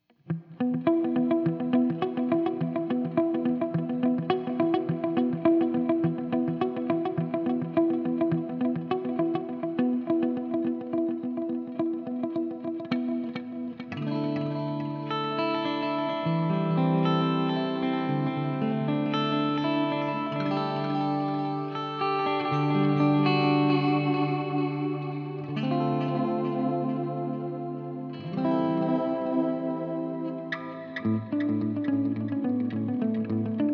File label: clean